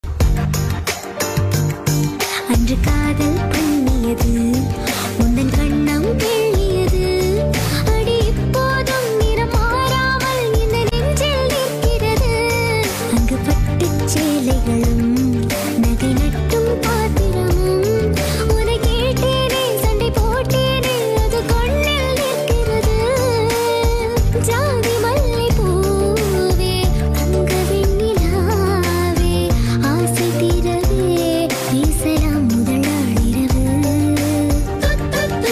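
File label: song very nice voice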